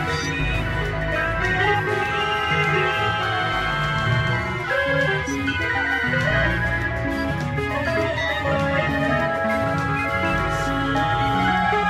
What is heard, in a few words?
midi mix